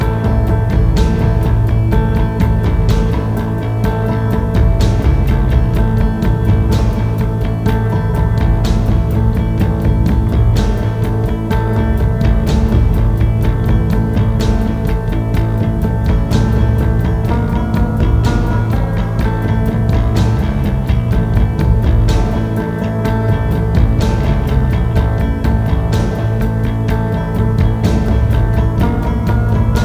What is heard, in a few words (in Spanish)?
musica inicio; ze base